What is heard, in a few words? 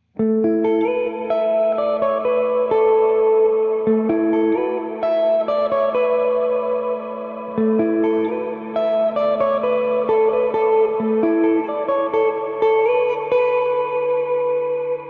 X-SPACE CHURCH; xgear